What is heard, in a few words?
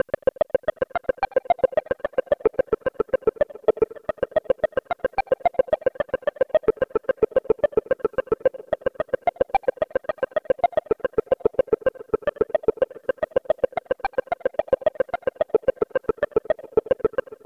Light Percussives, Electro Lounge; Sonic Foundry (Sony Creative Software); 110 Kickin